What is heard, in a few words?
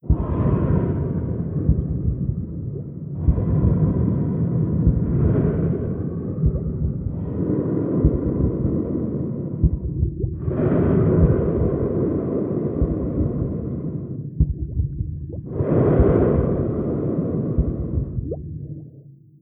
Incoming swarm message; Stellaris